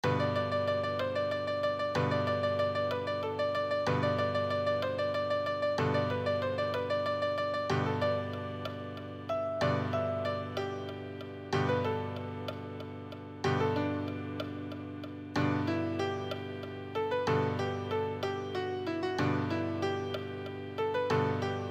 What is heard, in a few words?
easy solo, piano